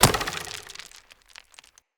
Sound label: Hunter Bow Arrow Impact Rock; Weapon Impacts; Player; Bows; Environment; Envoy Hunter Bow